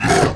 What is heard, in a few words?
Claw Mutant Attack; Playable Tutorial Level; TR3